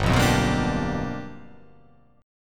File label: G 7th Sharp 9th G chord